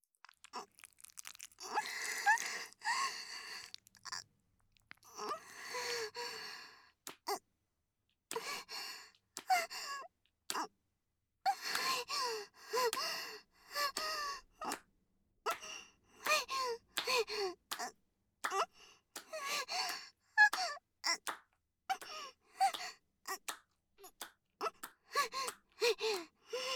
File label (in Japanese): 挿入 喘ぎ; SEあり